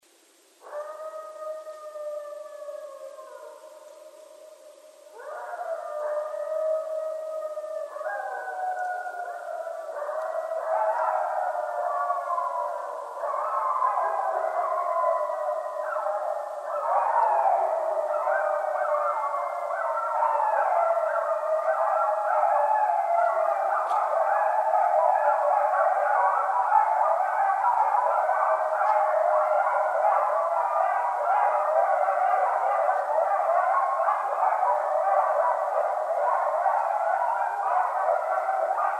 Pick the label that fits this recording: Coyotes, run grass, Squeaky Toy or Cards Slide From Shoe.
Coyotes